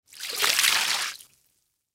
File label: Water Splash On Cement Floor; Splash Sound Effects; Splashes; Objects; Blastwave